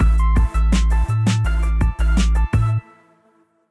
Action

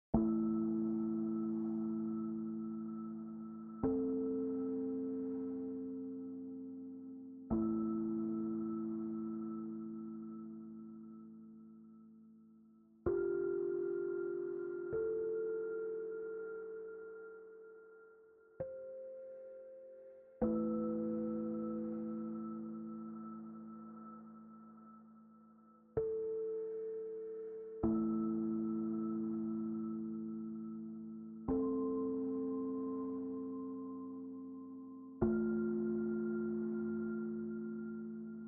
Exploration General Piano; horizon zero dawn

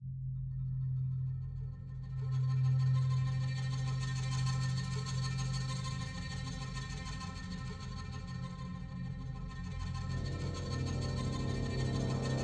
Ambient Idea Nonloop

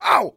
crash

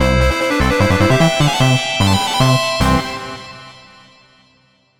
VS Victory; Bomberman 1 & 2

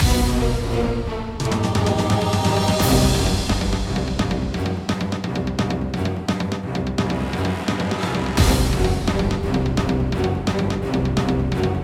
music sky; Castle Storm

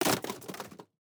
metal break; teardown; ps5 windows xbox series xs

